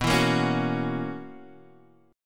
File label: B Major 7th Suspended 2nd Suspended 4th B down Guitar Half Step Down